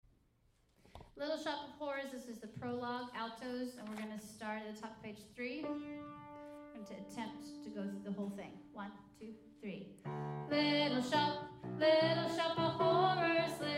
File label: prologue alto